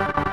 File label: synth